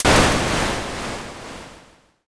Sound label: thunder